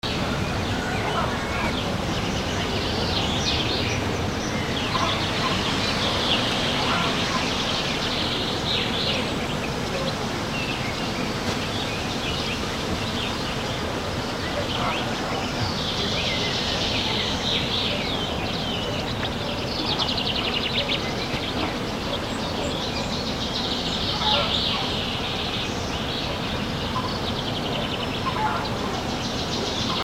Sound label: rustic small village and dogs